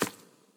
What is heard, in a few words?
footsteps hewn; Fate The Cursed King